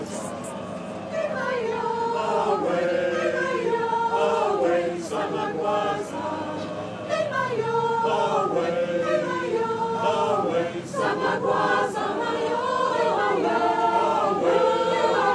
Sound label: choir singing in the sunshine; Oakwood Market